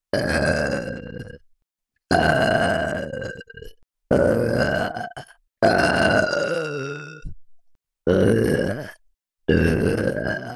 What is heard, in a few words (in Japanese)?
ゲップのみ; Ｗ耳舐め手コキ